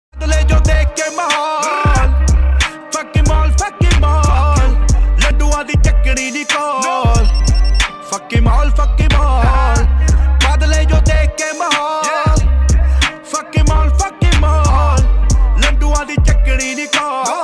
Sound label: Punjabi song ringtone